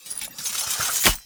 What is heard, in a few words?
Idle Sword Twirl; Arthur Hub; Nineteen Ninety Nine